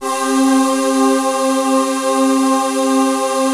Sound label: VOICE PAD; Best Service; XX Large; Pads